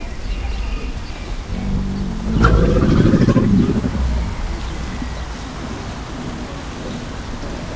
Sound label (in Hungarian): teve egyveleg, egypúpú teve; állathangok; nyíregyházi zoo 2010